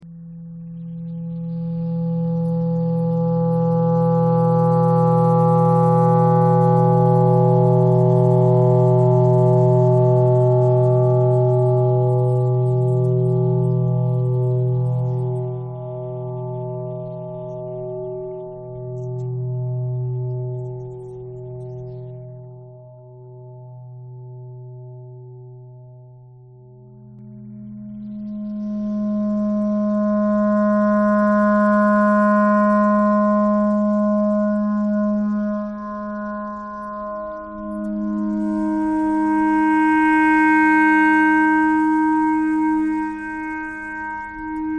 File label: clarinet thread noise